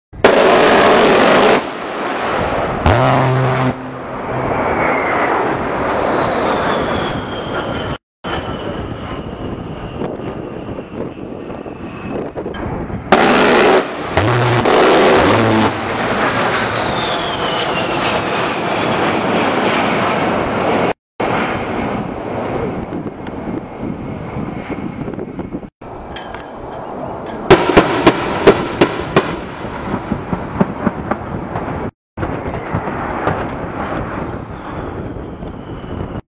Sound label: brrrrrrt